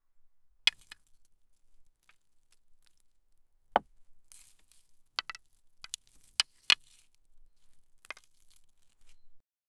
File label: taking wooden sticks and